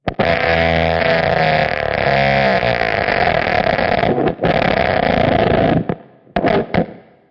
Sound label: dying divebomb, guitar noizes